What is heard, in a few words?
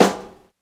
short reverb snare sound b key